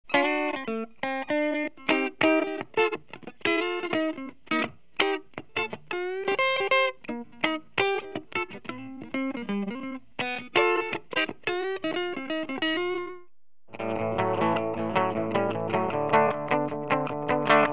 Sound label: clean Crown D-75; Model 7